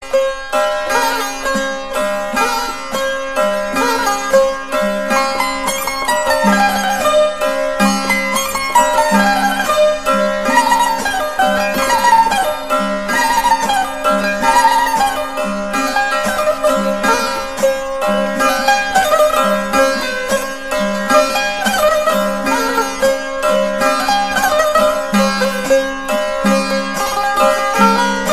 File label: balochi music